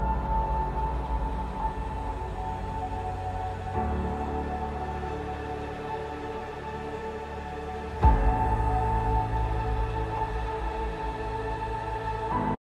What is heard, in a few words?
dramatic effect